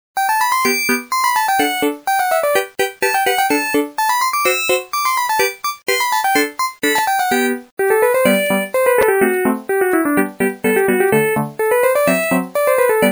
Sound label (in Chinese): 音乐铃声